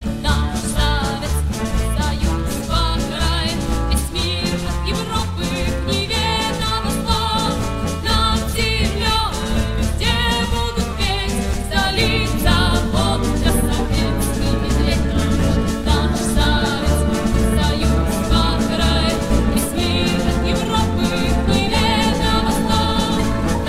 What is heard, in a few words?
Soviet march